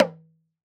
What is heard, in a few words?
Djembe Junior; World Percussion; Cymatics; Emperor World Percussion